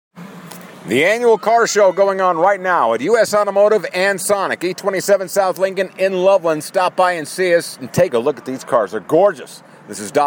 car show speed

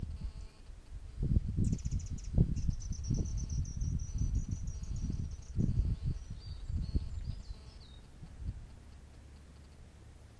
birdsong

